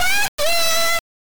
Coin; Shooting Master System 2; arcade

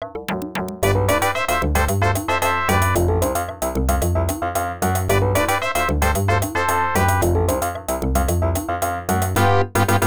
Salsa; galaga 88 namco system 1 arcade

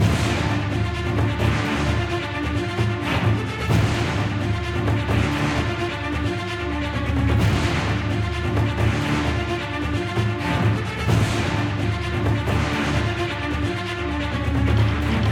Music - Frantic Battle Loop; Smashy Road Arena android ios 2016

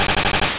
String Shot; sfx; attack moves; pokemon; gen 2; gsc

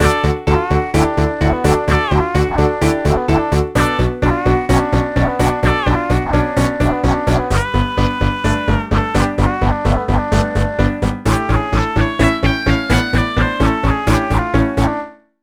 Rock And Roll

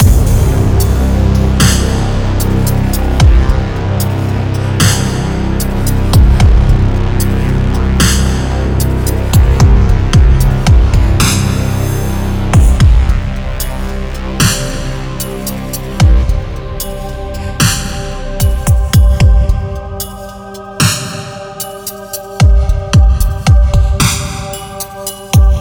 Music Death Beat; Lucius III

